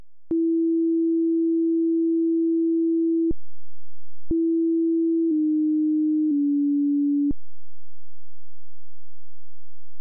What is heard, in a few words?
female poverty sine